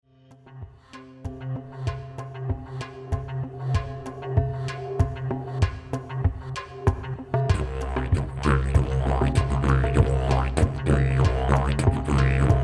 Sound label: Game On in Gamelan; Didgeridoo